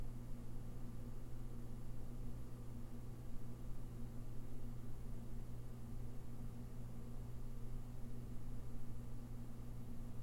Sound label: slamming sound